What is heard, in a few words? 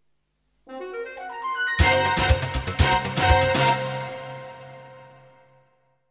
Jingles; 90 DDD Jingles